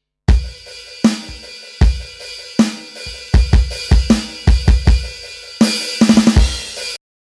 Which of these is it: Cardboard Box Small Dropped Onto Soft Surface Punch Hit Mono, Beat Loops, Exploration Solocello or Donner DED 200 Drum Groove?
Donner DED 200 Drum Groove